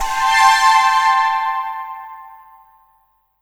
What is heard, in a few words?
PAD -R, Drum & Bass, 160 BPM; Ueberschall; Drum & Bass Resonance